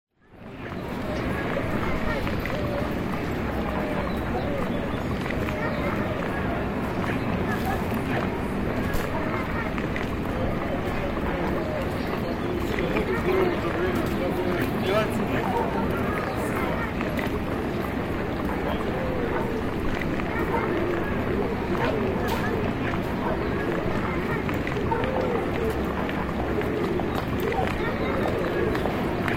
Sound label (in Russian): zvuki i shum ulicy goroda